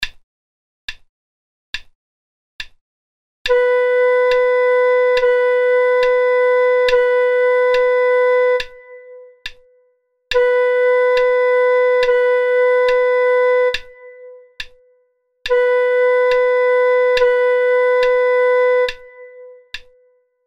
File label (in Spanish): ejercicio de blanca